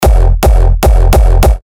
Kick F; Magma; terra kicks; Revealed Terra Kicks

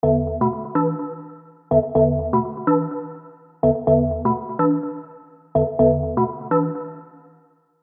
Bubbles, house stabs; alonso saloon serum 2 house stabs

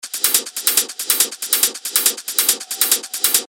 techno loop 140 BPM; Halo; revealed techno top loops vol 8

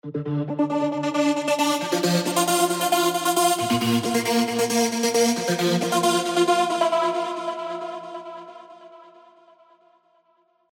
Trance Gates; Age Of Rage; Revealed Serum 2 Trance Gates Vol. 1

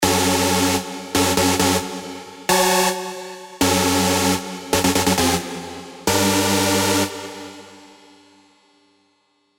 Stab Med Check; revealed serum 2 rave asylum

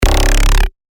Bass Shots C#; Rival; revealed bass shots vol 3

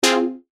Synth Shot F, synth shots tech house; Felonious; revealed

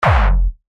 Bass Shot F, synth shots tech house; CORE; revealed

